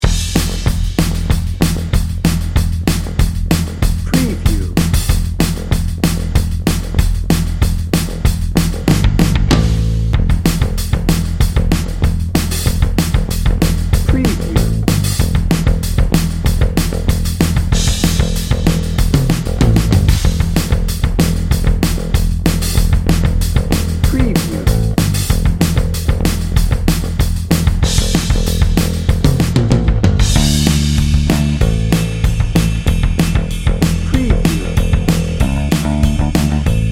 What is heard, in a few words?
rock fight dnb; Fast, powerful, Heavy Metal – Hard Rock. Driving distorted guitars fueled by driving bass